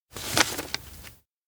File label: paper flip turn; anemoi